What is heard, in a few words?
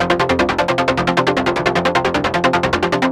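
fast, fast sequence; Music Store; Yamaha VP-1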